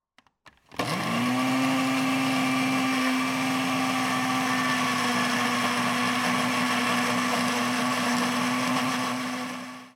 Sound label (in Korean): 믹서기; 믹스기